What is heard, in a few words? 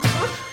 ooh